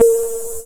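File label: cymbal, dance drums; Best Service ProSamples vol.15; AKAI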